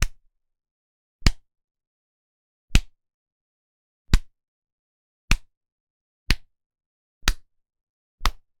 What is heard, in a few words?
slaps; human; NS Studios - ultra sound collection